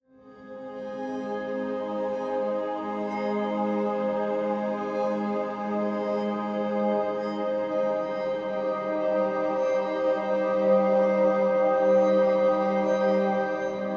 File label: Delta Binaural 432 Hz